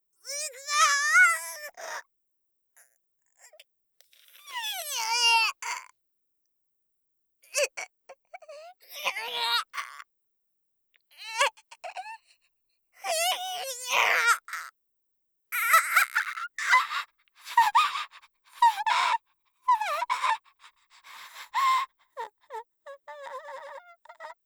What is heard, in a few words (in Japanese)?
歯車巻き込み; 効果音なし